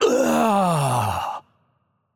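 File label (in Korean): Death, Korean; ko KR; VO; bloom minigame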